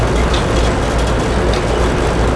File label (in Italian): Veicolo, motori